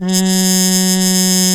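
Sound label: F#3, mute trumpet; AKAI S-Series CD-ROM Sound Library VOL-2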